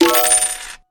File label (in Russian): zvuk deneg